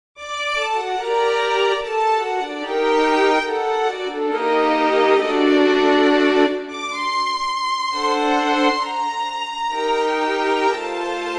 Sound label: Violins, cordes; montage